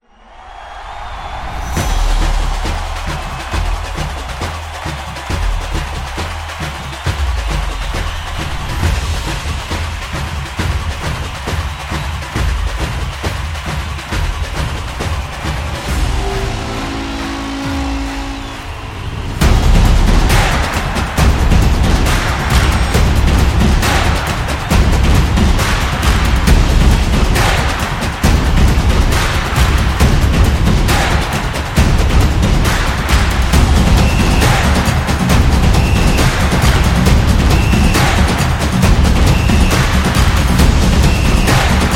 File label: Soccer Stadium Percussion; football music